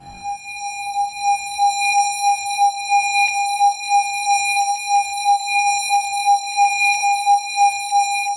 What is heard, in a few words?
Alarm